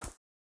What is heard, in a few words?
wolf footstep wood hard; Rust